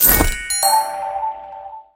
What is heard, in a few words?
checkpoint gold